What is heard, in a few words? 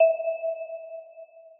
Bell E6